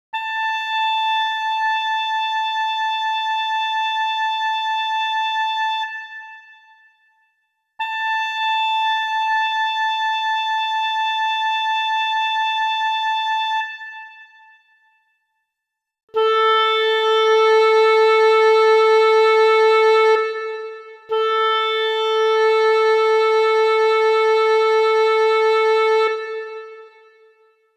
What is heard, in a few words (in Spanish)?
LA 440 OBOE